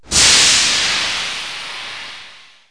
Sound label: Steam (Single Blast); Weird